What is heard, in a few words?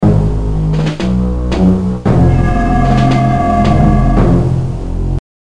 Failed; Splinter Cell; GBA